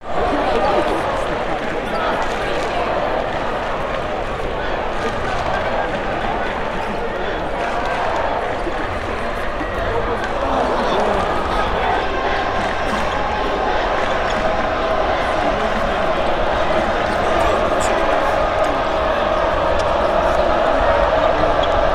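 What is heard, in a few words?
Arena Crowd Noise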